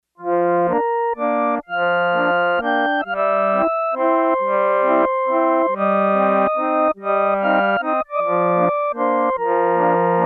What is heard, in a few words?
Harmonium; Yamaha TX802; Factory Patches; Voices